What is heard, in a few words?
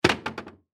Kitchen Cabinet, Cabinet Door Close; Kitchen Cabinet Door Close Hard Bounce; Household; Blastwave